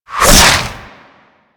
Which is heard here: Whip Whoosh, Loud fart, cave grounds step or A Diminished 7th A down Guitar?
Whip Whoosh